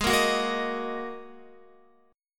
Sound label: G sharp 7th Suspended 2nd G sharp Guitar; Listen to G#7sus2 strummed